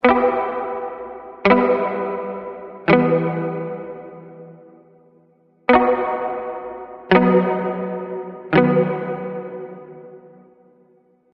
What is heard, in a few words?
guitar